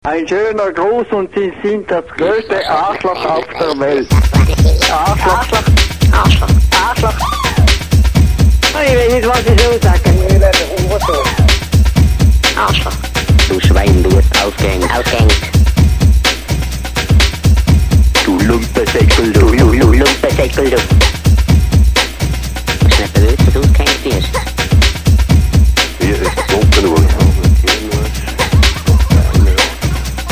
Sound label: Hiphop; The VgT-Hiphop: Eine musikalische Kreation basierend auf Original-Telefonstimmen